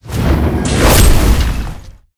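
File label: lava minotaur attack, monster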